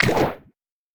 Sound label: Bio Plasma Shoot